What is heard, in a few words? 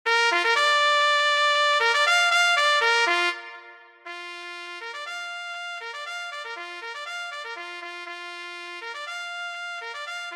Bugle; bugle band; london band drill competition